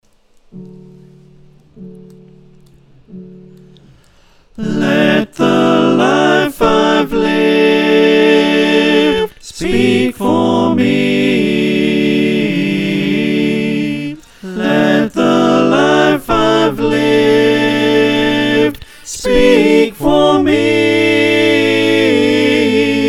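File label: soprano alto tenor bass, choir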